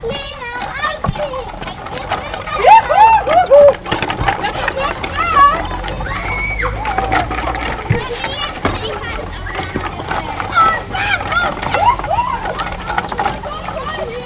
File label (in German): Spielplatz